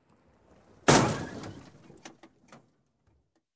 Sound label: Car Crash